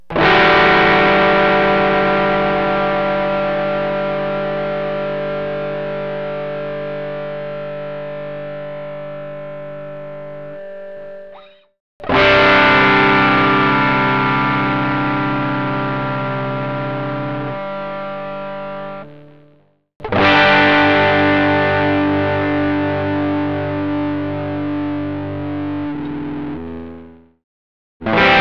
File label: guitar